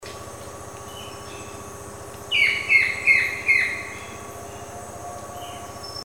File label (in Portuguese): canto do pica pau chocolate